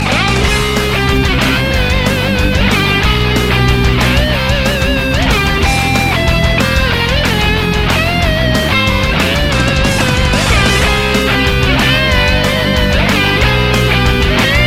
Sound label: happy birthday rock